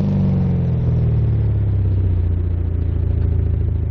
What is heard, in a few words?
car decelerate; BALLY